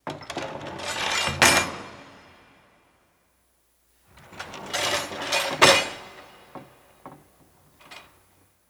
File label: Doors Jail Open Close; Home & Office; Sony Pictures Sound Effects Series Volumes 1-10; Sony Creative Software